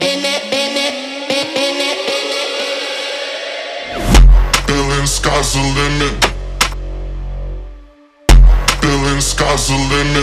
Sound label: Brazilian Phonk